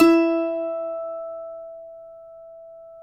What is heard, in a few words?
cuatro; Spectrasonics; Hans Zimmer Guitars VOL-2